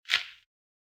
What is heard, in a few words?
footfall outdoor step; soundscrate